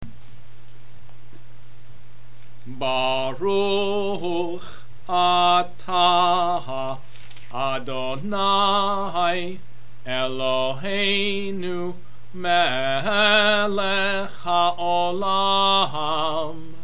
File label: blessing before haftarah